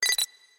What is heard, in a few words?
signal